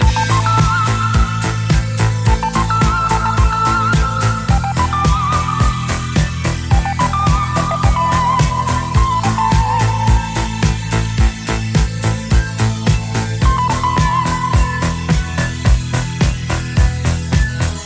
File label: Disco Sound